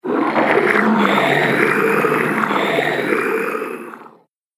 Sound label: Swamp Mutant, monster sound; Fantasy Sound Effect